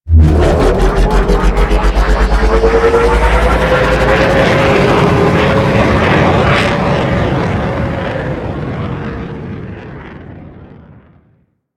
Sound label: Drop Ship Depart; Warframe; Corpus